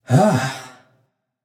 nv sigh; smite; xbalanque

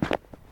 footsteps; smite; chaac

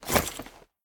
pet armor; smite; lancelot

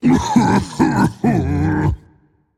boohoo rage; chaac

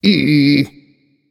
tongue a; babayaga

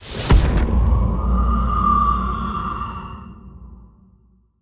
lobby landing; smite; osiris

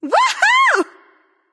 yay light; smite; voicepack; hel

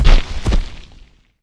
Death Fall; smite; kumbhakarna

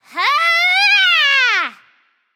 battlecry; smite; amaterasu

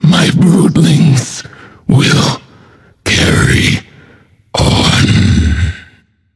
death; smite; arachne

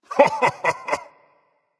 heh heh berserker; cuchulainn

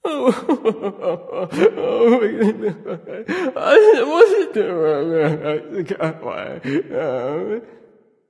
boohoo; smite; hou yi

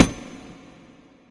movement blade ground; guanyu; smite; hades

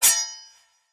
claw ring; smite; god cthulhu

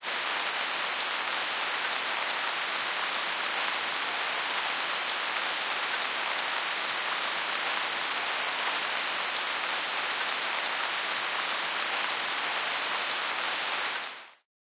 defeat rain; smite; chaac

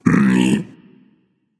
tongue, voice; Smite; ymir skin14

